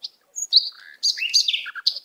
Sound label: birdsong short